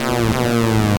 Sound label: sci fi alert ringtone